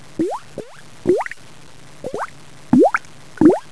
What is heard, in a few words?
drown; XMEN2